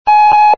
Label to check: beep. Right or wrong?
Right